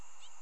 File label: Thrush